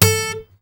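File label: GUI Ac. A4, acoustic guitar; Best Service Gigapack 2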